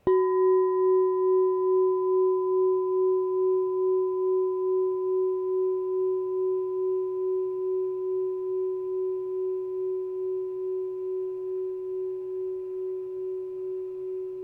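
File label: 6inch F sharp +23 chime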